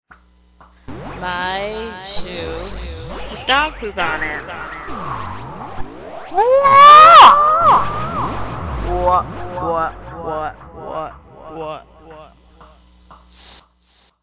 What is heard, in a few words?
dog Poop